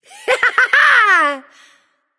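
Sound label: yay, female; smite; voicepack; hera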